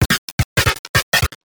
drum loop D+B 160; Drum+Bass

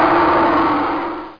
GROANER

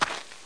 player dirt; Group Mapping Project

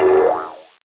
trampo; Nejlepší České Hry

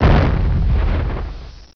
EXPLOS; environ; Gunlok

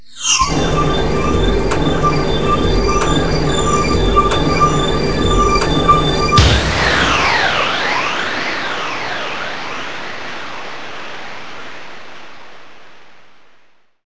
gate, space; Select Soft Publishing Stock Clips Multimedia Clips

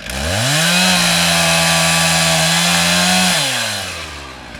CHAINSAW; RallyC; CD-Gamer 2000-05

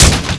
crash; Segatour

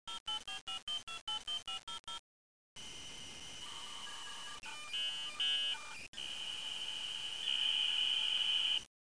modem

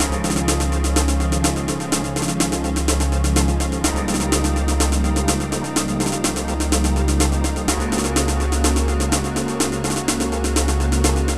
tune; music

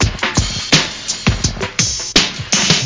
snap base loop; World of Amiga; music

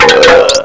Pump Burp; Crystal Crazy Demo; Casady & Greene

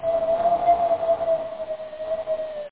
howl

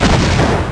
spy boom; NETSTORM

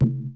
balloon hit top; Pop the Wheezil

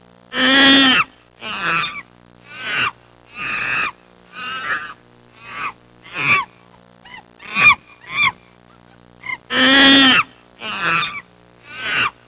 one annoyin, animals various; Sound Effects for Multimedia - Volume 1

